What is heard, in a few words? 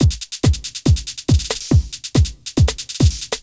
techno 140